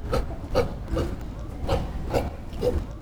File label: call